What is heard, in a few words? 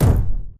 Footstep Jog Mid; Titanfall 2